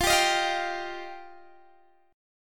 F 7th Suspended 2nd F Guitar DADGAD; F7sus2; strummed; x,20,17,20,20,17

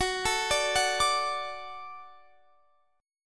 Gb Minor Sharp 5th Gb Guitar Standard; x,21,19,19,19,22; arpeggiated; capo=3

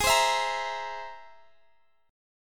C Augmented 7th G sharp

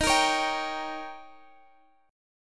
D sharp 7th D sharp, Guitar, Standard; strummed; D#7; x,x,13,15,14,15; JGuitar

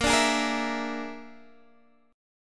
A sharp 7th Flat 5th A sharp, Guitar Standard; strummed; x,13,12,13,11,12; chord; JGuitar

